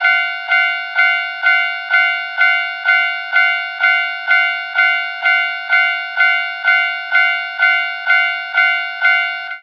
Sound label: crossing